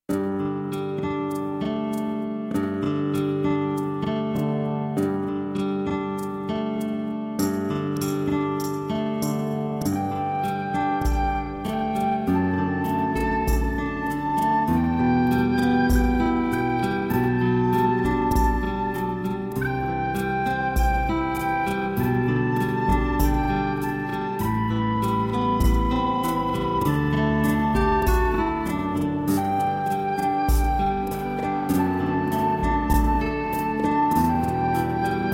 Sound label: Land's End - melody (slow)